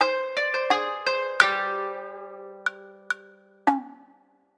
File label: Demo Jingle; Oracle